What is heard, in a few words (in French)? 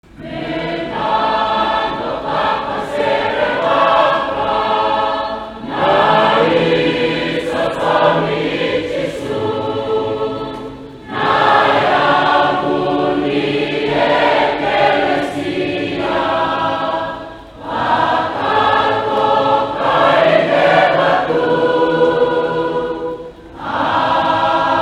chant a Marie; sortie; oceanie